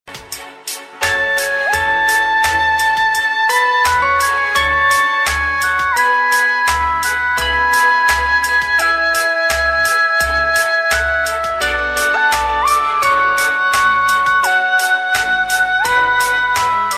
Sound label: Digital Music